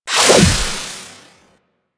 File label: Sanctuary Target Blink; Warframe